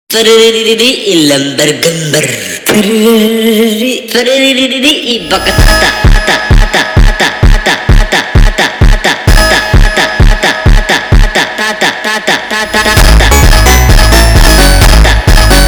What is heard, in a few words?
Brazilian Phonk